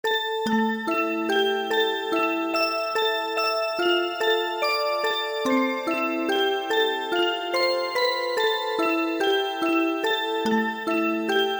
Music Box

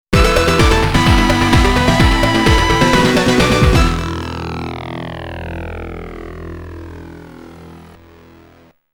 Round Clear; streets of rage 2

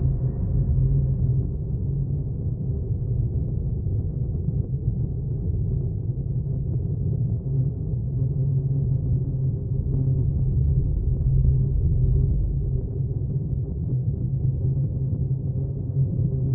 Mine Tension; Outlast 2; PS4 Windows Xbox One

